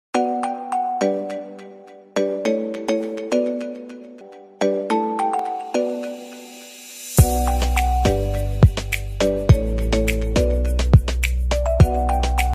cool phone ringtone

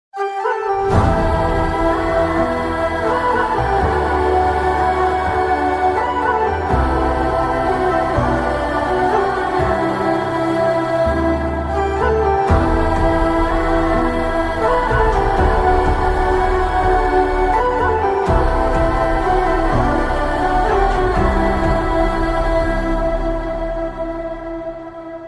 mother emotional bgm ringtones emotional bgm; KGF

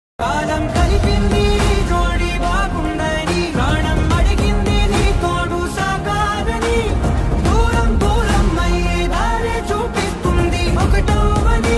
ringtone telugu ringtone

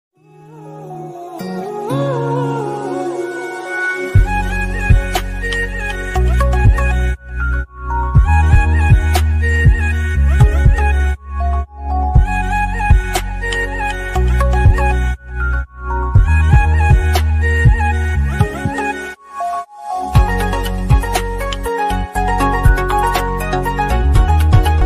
assamese call ringtone music ringtone

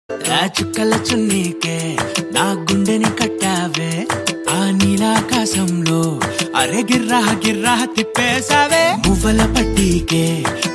mobile ringtone, telugu; sr kalyana mandapam

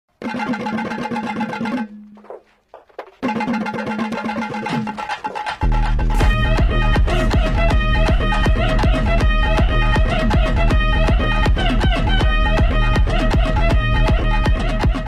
climax fight bgm; maaveeran; sivakarthikeyan

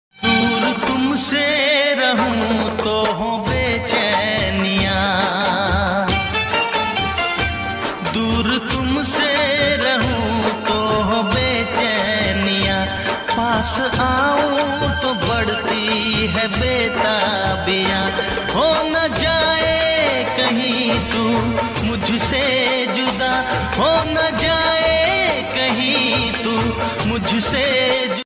hindi song hindi ringtone

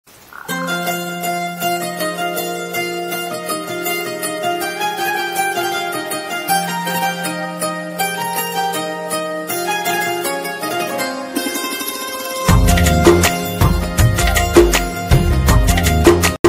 instrumental ringtone

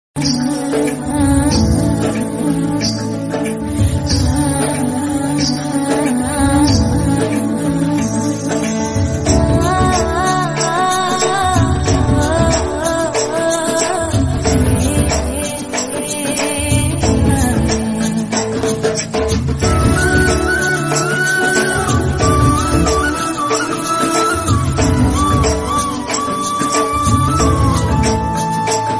tamil love bgm ringtone south love bgm ringtone

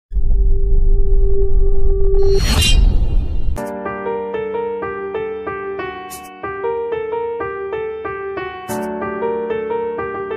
ringtone bgm; sashi movie

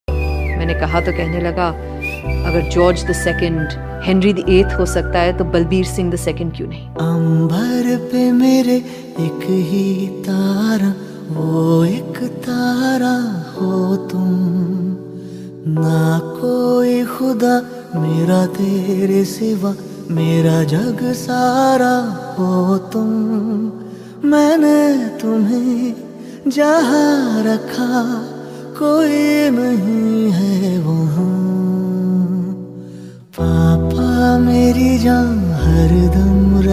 ringtone, hindi punjabi song ringtone; animal

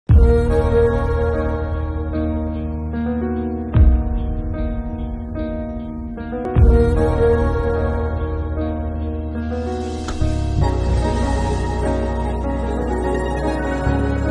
love sad bgm; bhanumati ramakrishna movie

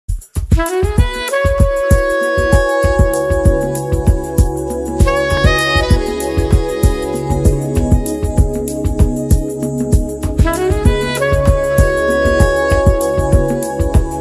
bgm saxophone

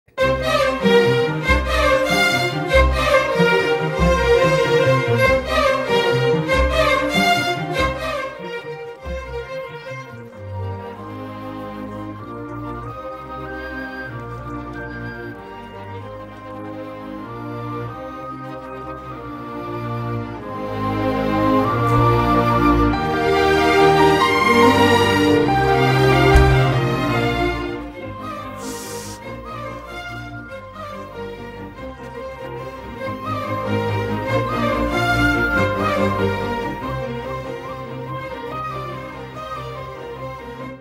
movie proposal scene bgm ringtone; oy movie

